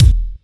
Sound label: Wherehouse, Kick Drums; Zero-G; Soundclash